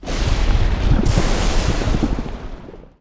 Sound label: skill blizzard